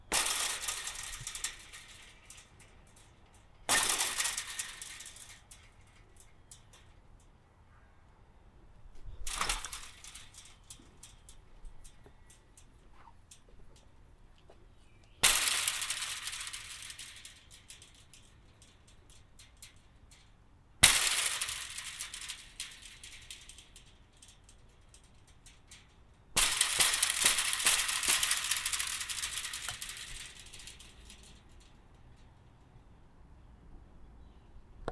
Chain Link Fence Impacts

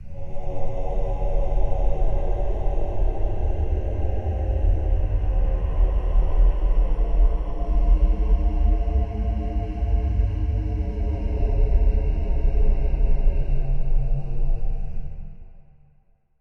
Deep Bassy Monster Howl

